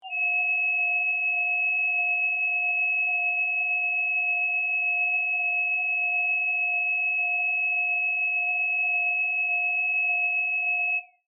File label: Scanner High Pitch, Scifi, Ring; Science, Fiction; Tech Sound Effects; BlastWave